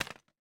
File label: Magnet Drop; Game rip; MiSide